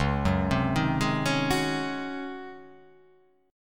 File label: Db Major 7th Suspended 2nd Suspended 4th Db; DbM7sus2sus4 arpeggiated; JGuitar